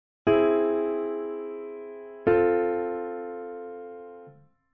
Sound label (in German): Gehoer Akkorde; Gehoerbildung